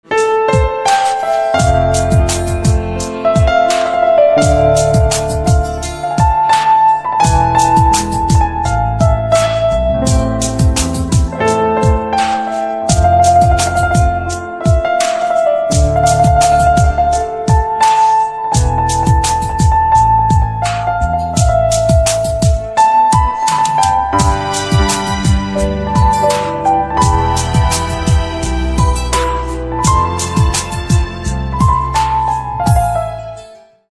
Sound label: Piano Instrumental Ringtone